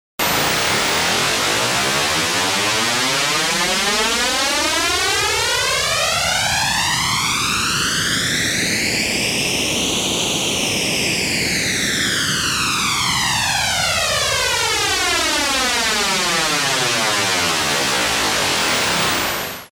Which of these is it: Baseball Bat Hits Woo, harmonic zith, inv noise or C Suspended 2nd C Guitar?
inv noise